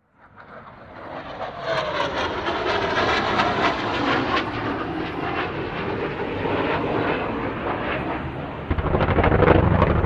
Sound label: RAF Hawker Siddeley Harrier, three fly overhead firing cannon (some tank engine noise in foreground); Airplane Sound Effects; BBC